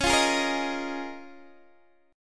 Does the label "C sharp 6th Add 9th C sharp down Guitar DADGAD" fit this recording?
Yes